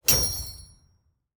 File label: Unlock